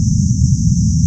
hoverbug nitro loop; There; ThereClient

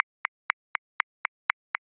Accent 8ths; Ableton Live 12 Lite; Core Library; Grooves

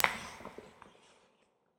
Textures Rolling Rocks; Impulse Responses; Ableton Live 12 Lite; Hybrid

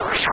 Sword 360; Weapons; Open Zelda; Quest Designer 2.0.4b

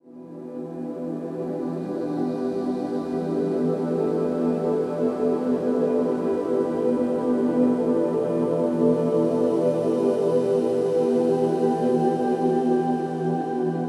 Mood Atmos E 130 bpm, Synth; Loops; Tonal; Ableton Live 12 Lite; Core Library

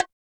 Bongo Shy and Dry; One Shots; Drums; Ableton Live 12 Lite; Core Library

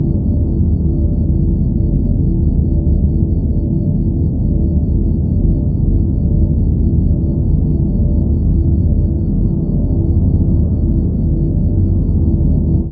Atmos Calm 130 bpm, Synth; Loops; Tonal; Ableton Live 12 Lite; Core Library

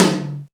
Tom R100 Clean, Drums

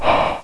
gasp; Digital Paintball 2; player

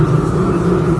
hoverpack yaw; There; ThereClient

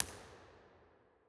Mid Way to Altar L; Real Places; Impulse Responses; Ableton Live 12 Lite; Hybrid

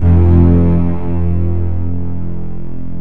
Strings; Ableton Live 12 Lite; Vector; Sprites

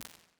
Firecracker Snare; Convolution; Small Rooms; IR Samples

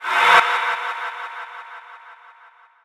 Swell Short Noise

